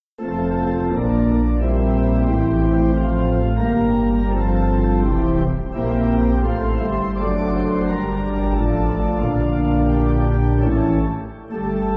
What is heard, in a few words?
My Saviour Thou Thy, pipe organ; Sidmouth 8.8.8.8.8.8